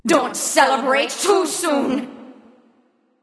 death; smite; voicepack

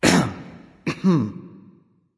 clearthroat; erlangshen

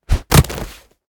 defeat stumble; smite; kuzenbo

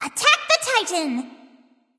vox attack; smite

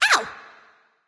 ouch; smite; aphrodite

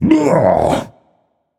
ugh; chiron

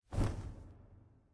flap; smite; kukulkan

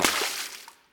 defeat splash; smite; khepri

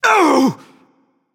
Ouch; smite; anubis

